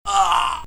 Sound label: Die High Damage